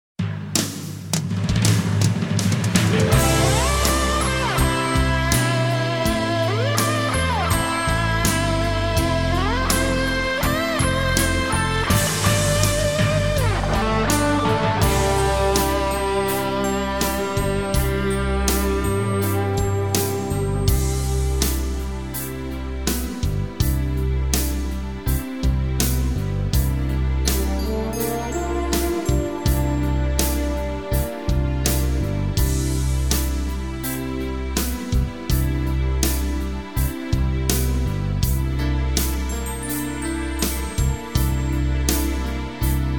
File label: brass minus